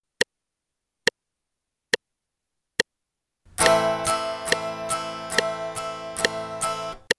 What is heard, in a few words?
Upstrokes downstrokes Am, guitar